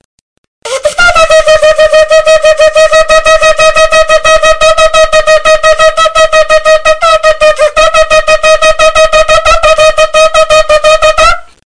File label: teruah